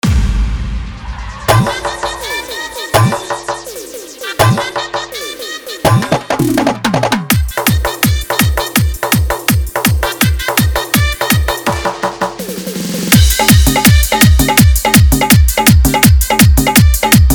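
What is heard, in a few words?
Bhojpuri Old Hit Full Dhollki Bass Dance Mix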